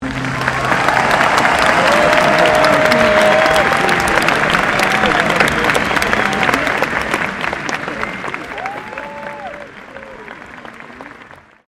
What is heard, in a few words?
Crowd Large Outdoor Event Applause, Outdoor event crowd; Human